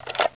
aksu clip out, weapons

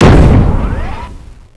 walk; mad machine

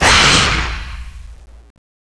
zombie ghost draw, claw; ghostfury

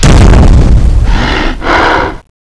appear

